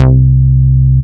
Bass Note C

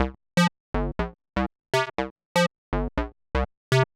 121 Bass; Combi Loops; Jack In Da Boxx; Zero-G; Soundclash Nu Dance Electro; Acidized Wavs

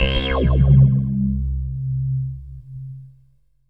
Short Reso Sweep C 120; Trance Formation

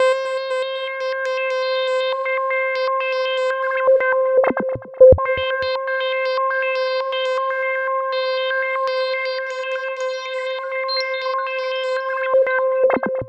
Roland JV 2080, interval; MG INTERVAL

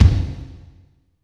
KICK, Tekno Industrial; East West

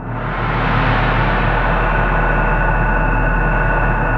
TRANSPONDER, ROLAND JV80 - 880; Rubber Chicken Software Sample Bank

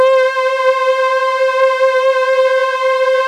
VOX HUMANA, ENSONIQ TS-10; Rubber Chicken Software Sample Bank

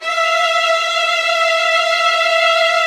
HF TRLL E5, Violin; Q Up Arts; Denny Jaeger Master Violin Library; PERFORMANCE

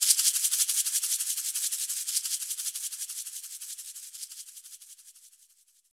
Afro Shakers, Shake & Rattle; Roland LCDP14 Africa VOL-2

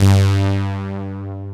POLY KEYS; Pro-Rec - Synthsonic

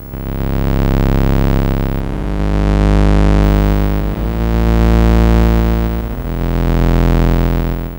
Atari 2600 Bass, chiptune

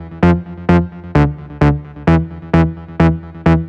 NRG Bass, Trance

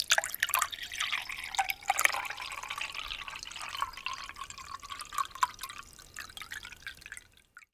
POURING, KITCHEN; AKAI S6000 CD-ROM - Volume 6; Daily Life

